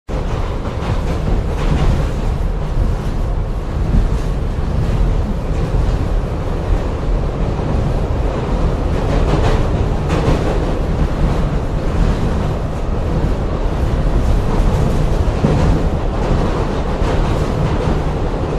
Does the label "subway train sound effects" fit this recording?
Yes